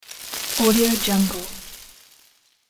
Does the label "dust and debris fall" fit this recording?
Yes